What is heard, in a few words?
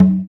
Bongo; Vengeance Samples; VEH1 Percussion